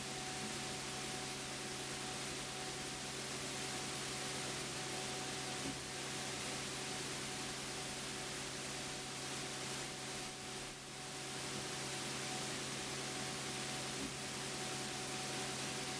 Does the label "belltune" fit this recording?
No